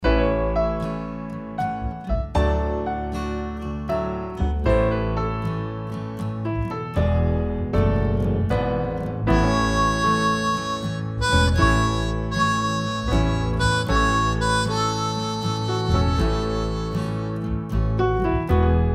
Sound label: Ballad; Nancy Drew Mobile Mysteries: Shadow Ranch